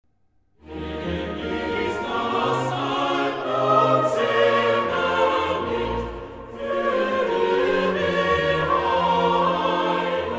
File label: Choral (Chor); Er Ist Das Heil Und Selig Licht